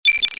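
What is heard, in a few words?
message beep; Babylon 5 - Entertainment Utility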